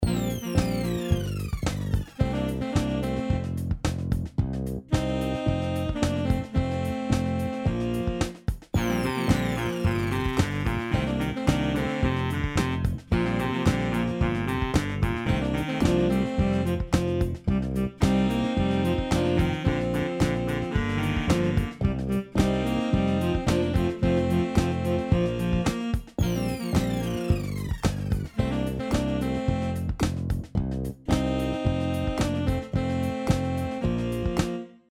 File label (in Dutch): drumstel zonder zang